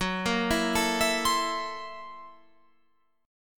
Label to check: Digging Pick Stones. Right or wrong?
Wrong